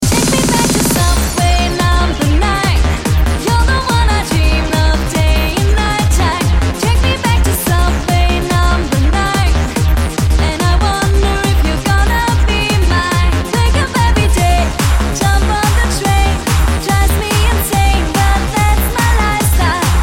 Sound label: trance; quake